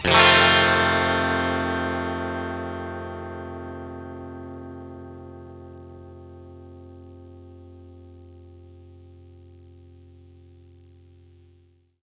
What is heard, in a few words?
clean chord F, clean electric guitar; clean electric guitar chords